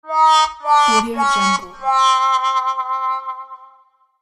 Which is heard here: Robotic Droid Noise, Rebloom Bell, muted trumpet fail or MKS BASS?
muted trumpet fail